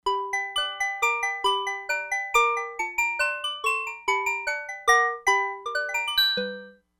MALLET BELL, Crumar Bit 99; Factory Programs

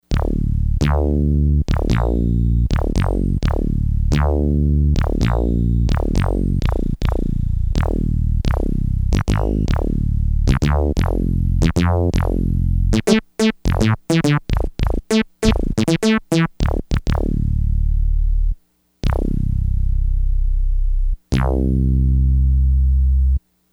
SyntheBass, Yamaha DX100; Factory Patches

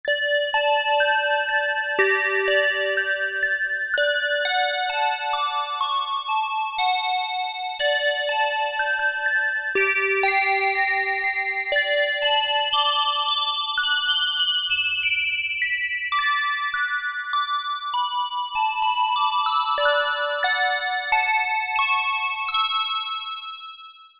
CARILLON, Oberheim OB-8; Factory Patches

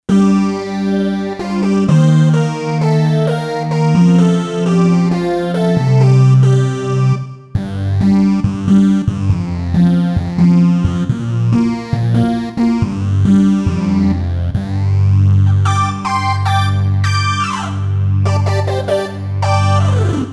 SUPER SAW, Roland SC-880; Factory Patches

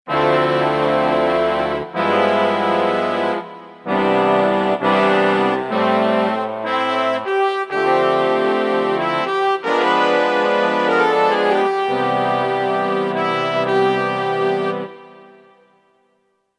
Soft Big Band, Kurzweil K2000; BRASS; Kurzweil K2000 Value Pack; Extra Programs; BRASS1X

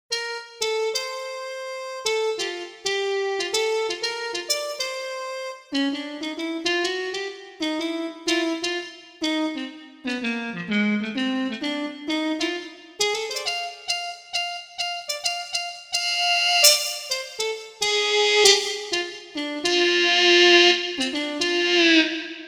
Harmon Mute, Roland JV-880; Factory Patches

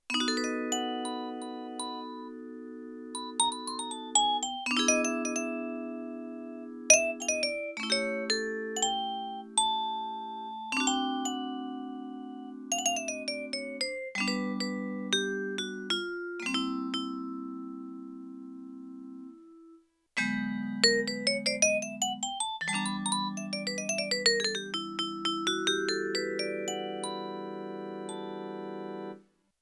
Gloken-Vibe normal, Vibraphone, Yamaha TX16W

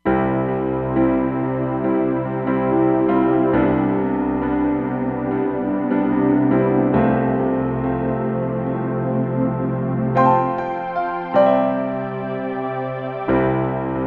X Piano + Str, Roland Fantom XR

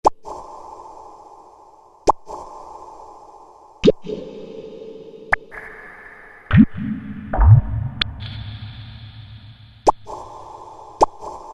Drop, Korg M1; Factory preset examples

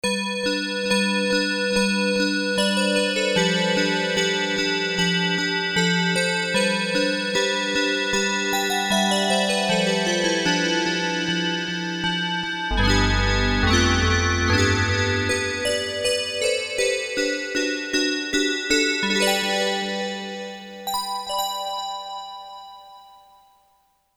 Bells, Korg DSS-1 DWGS synthesizer presets; Korg DSS-1 Sound Library

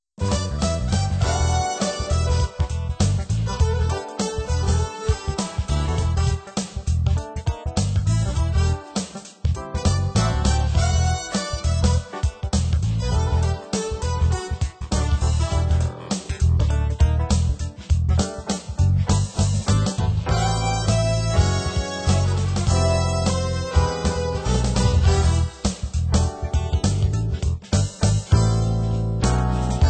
Yamaha PSR-530

